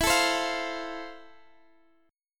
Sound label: F Major, guitar chord